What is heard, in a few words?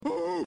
shock; stan